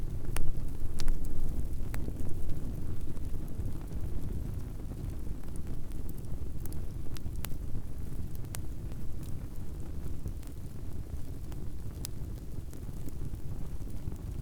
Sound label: Fire